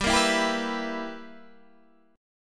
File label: B Major 7th Suspended 4th Sharp 5th G